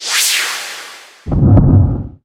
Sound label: cut in; ztd